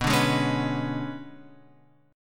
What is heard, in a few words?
B Minor 6th Add 9th B; Bm6add9 Chord {7 5 6 6 X 7} strummed